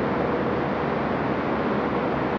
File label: tone